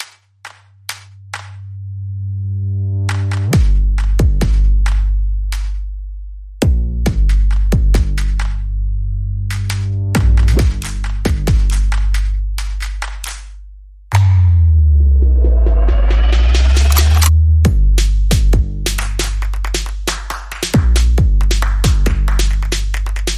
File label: Drums